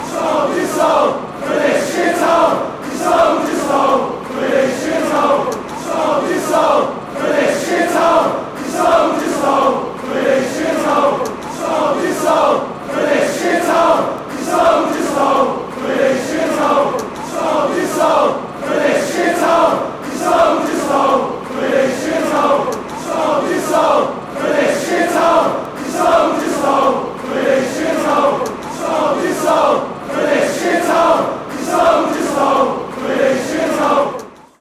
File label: fan chants